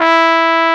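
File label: trumpet, SC55 brass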